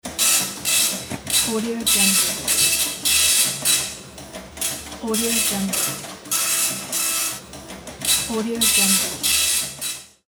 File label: old printer